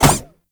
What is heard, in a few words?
Baby Step; Warframe; Nokko Colony; Visions